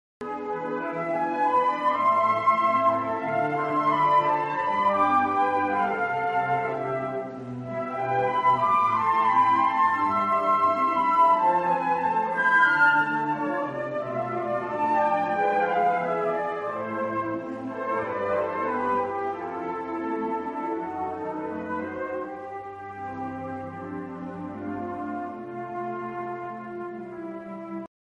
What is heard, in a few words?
concert, flute; autumn concert 2019